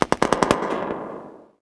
battle noise; firearms; thanatos

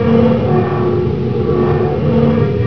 industrial; cstrike

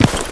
player step

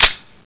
deagle slide forward, weapons; svencoop

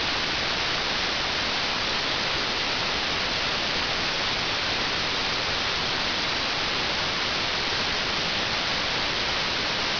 noise

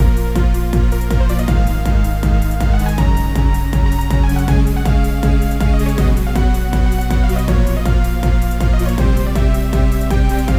techno; cstrike

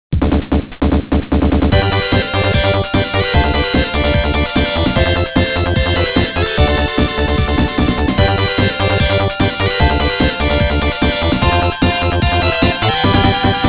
start; svencoop; sonic the hedgehog

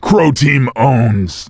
cheer; svencoop; voice commands; SeriousSam

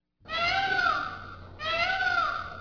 squank

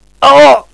pain; svencoop; paranoia; diversant

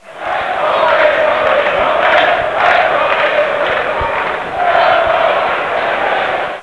chant, soccer; firearms

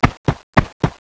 zombie dog walk

